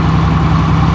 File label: tank idle